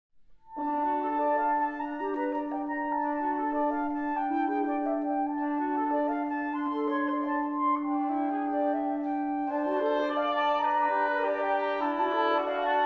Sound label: suite for woodwind quintet